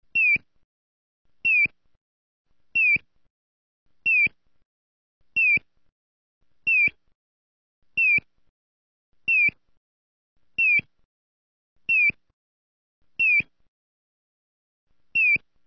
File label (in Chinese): 東西向通行導引音響(鳥叫聲)